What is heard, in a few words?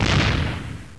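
saw explosion, weapons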